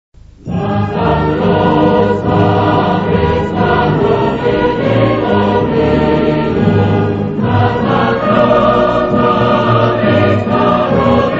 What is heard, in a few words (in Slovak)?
hymna